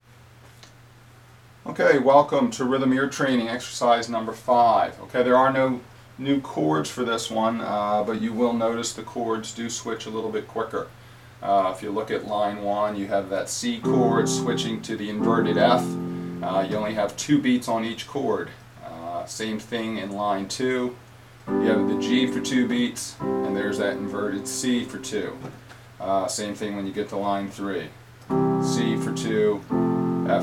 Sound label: Rhythm Ear Training Exercise